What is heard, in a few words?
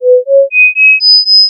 50Hz Increment